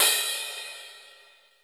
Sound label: SY77 CRASH, drum; Crypton - DrumBase5000; SY77 DRUMS